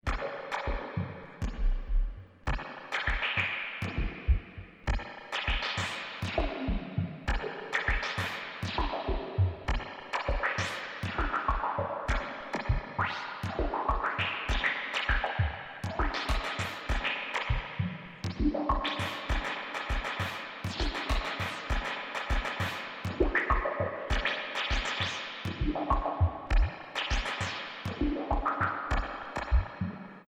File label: yamaha cs5 drumtraks external in